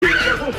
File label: ahhhhh